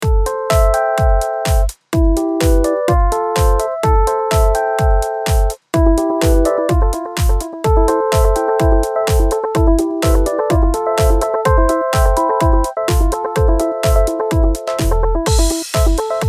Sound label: hologram electronics microcosm midisync rhodes seq hold sampler